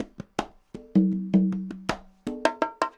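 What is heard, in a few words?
conga bongos, latin, 80bpm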